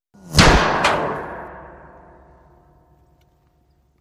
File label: Bullet with Whir Impa, metal